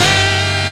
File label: HORNHIT, HORN STAB